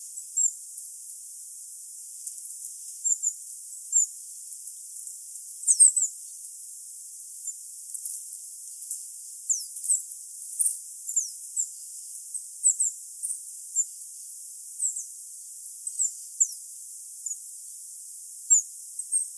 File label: Regulus regulus Parus ater